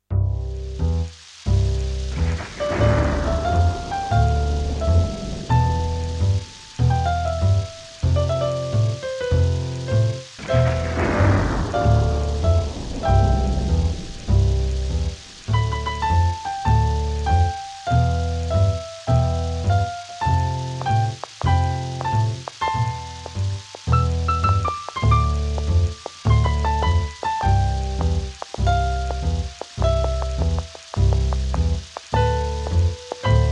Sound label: tapping